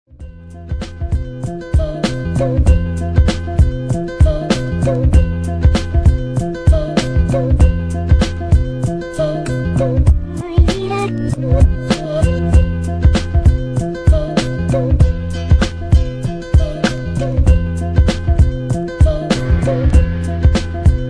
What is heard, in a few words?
instrumental